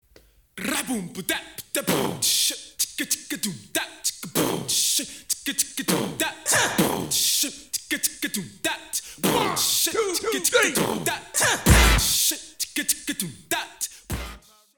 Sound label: Beatbox